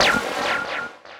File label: troll